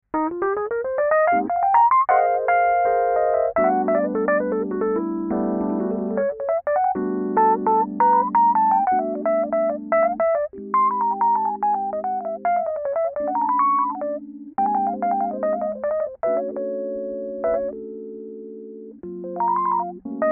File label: mark1a stage normal, fenderrhodes